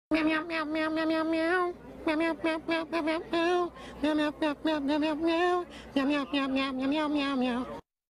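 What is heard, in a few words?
meowing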